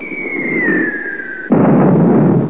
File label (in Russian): padenie i vzriv bombi